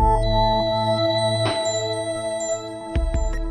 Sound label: Oppo A5s Ringtone; AX5s